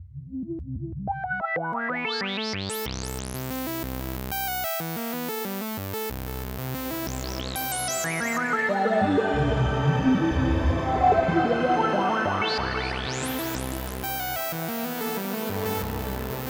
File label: Synthesizer Reverb Experimental Specular Tempus